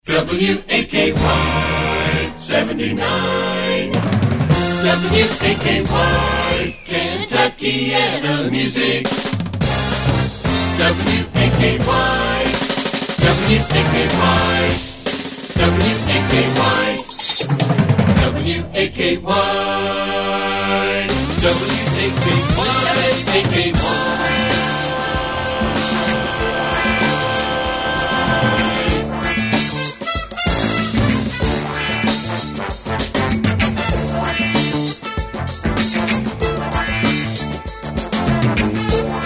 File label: Jingles; WAKY Gwinsound Jingles